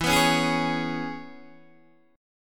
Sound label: E 6th, down, Guitar, Open D